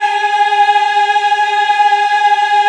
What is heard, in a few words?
G#4, Choir; USB Soundscan vol.28; Acoustic & Synth; AKAI